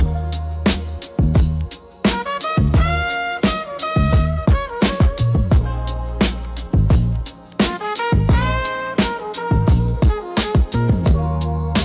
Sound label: beat; echoes glide